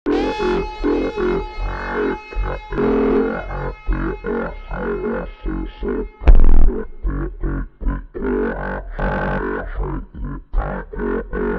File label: montagem vozes do phonk slowed